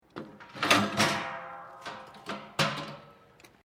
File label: cylinder gas presurized moved, Home heating gas bottles being replaced; Machinery; Machines